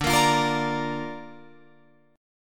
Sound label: D Minor Sharp 5th D down Guitar Open F; Dm#5 strummed; JGuitar